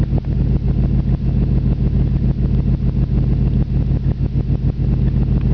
engine idle

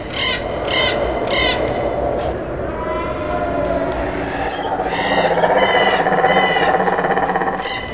dark woods, jungle sounds

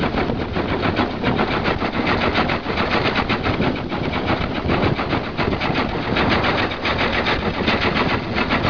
very old car engine

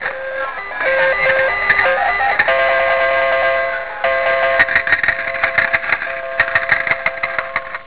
slot machine beep buzz

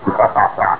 Short Evil Laugh

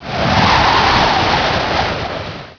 whirlwind

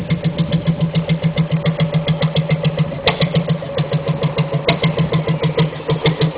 car engine ticking over

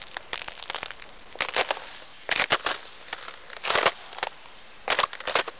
footsteps on ice

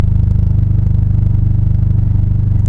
turbo engine idle

